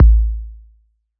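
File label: crunchy kit kick; STYLE KITS (Hip Hop, Etc)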